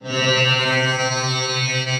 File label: Sitar Grains; Guitar & Plucked; Ableton; Factory Packs; Granulator III